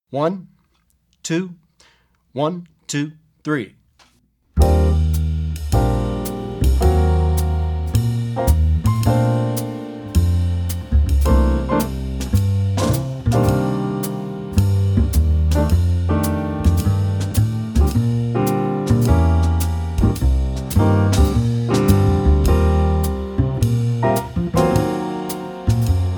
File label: Without Soloist